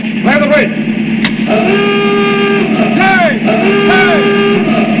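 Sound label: Diving Alarm, submarine; Caiman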